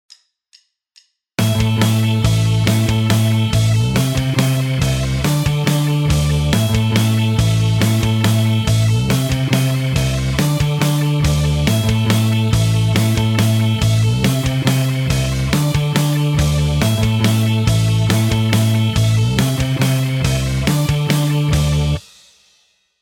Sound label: backing, guitar; Rockschool; Guitar Techniques 2018 Issue 289 December